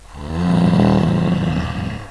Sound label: hummm, zombie; brainbread